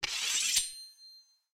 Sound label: Draw Sword; soundscrate